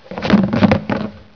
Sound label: soda machine